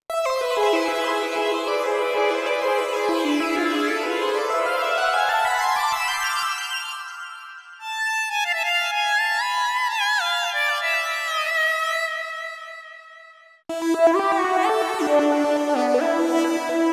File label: leads